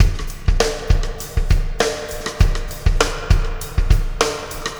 Vacuum Beat; Processed Drumkits; Sonic Foundry; Zero Gravity Beats

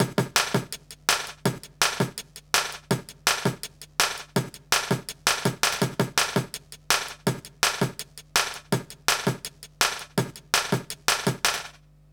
165 break, Explosive Jungle; USB Soundscan vol.05; AKAI; 90s sample CD

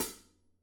Pedal, drums; PX7; Digital Sound Factory Protean Drums

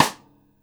Jazz kit rim, Drums Acoustic; AKAI; JAZZKIT 2; 90s sample CD; USB Soundscan vol.10

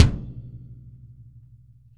KICK

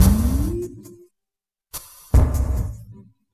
Slow Beat, Electronic Beats; Sonic Foundry; Myths of Technology

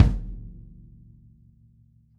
L KICK 2 F 5 L, Interactive Drum Kits; Sonic Reality

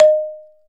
Balafon D#4; Multisamples; Digital Sound Factory Planet Earth

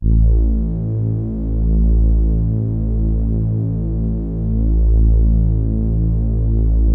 A1, ANALOG PAD; POLARIS; Music Store - Claudius Diemeter Schwerlos

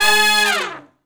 Med Length Falloff (Ab) unison, Horncraft for R&B; Sections; Crimson Blue and Fabulous Horncraft 4 RnB

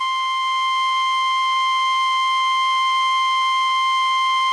CALLIOPE C4; Sonic Reality; American Heartland

